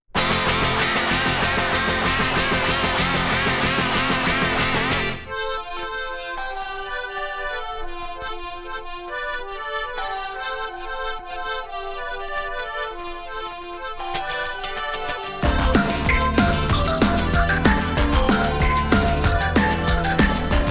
Jazz

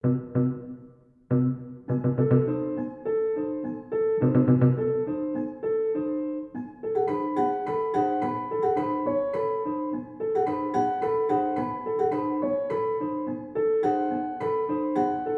Interlude

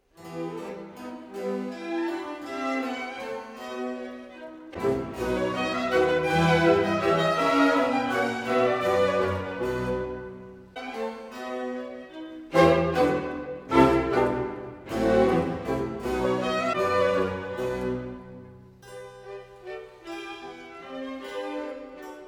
Concerto grosso in F major Menuet

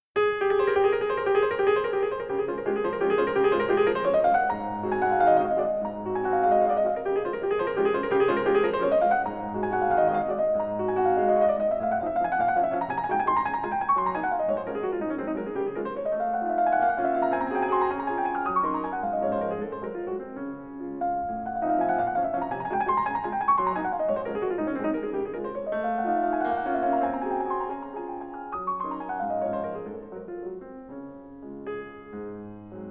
Allegro con fuoco; Jean-Philippe Collard; 8 Etudes-tableaux Op 33 No 6 in E Flat Major

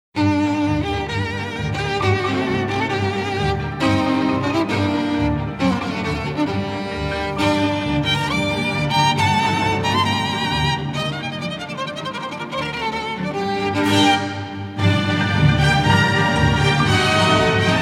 Hungarian Dance in G Minor; Isaac Stern; WoO 1; Arr A Harris for Violin Orch

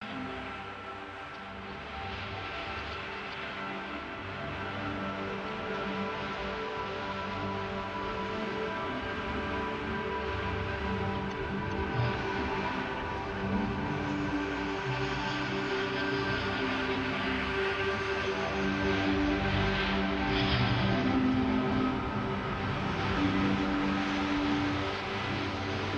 Sea Organ Remix

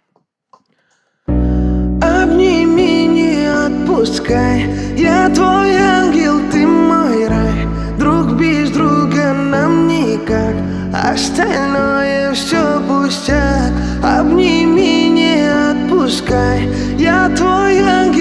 acoustic version

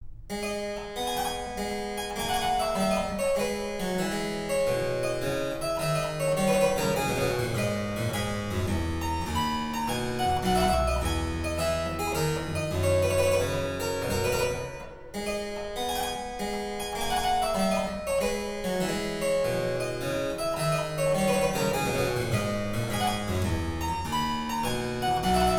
Harpsichord Suite No4 in A minor Saraband

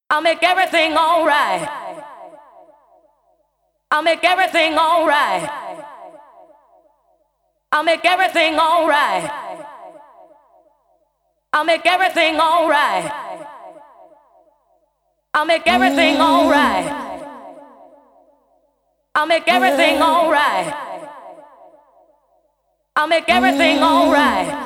Acapella

